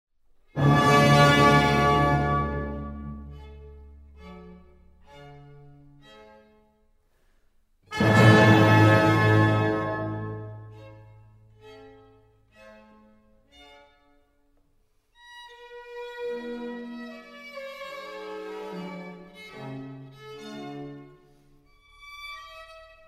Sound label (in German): Ouvertuere D-Dur Ausschnitt